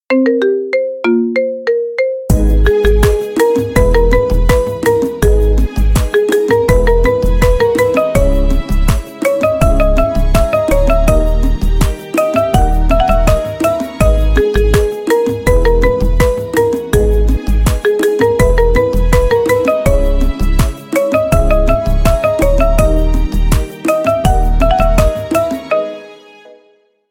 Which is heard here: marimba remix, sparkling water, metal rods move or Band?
marimba remix